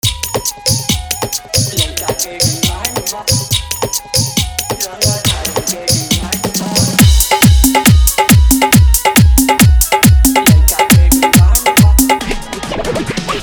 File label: Hard Dhollki Bass Mix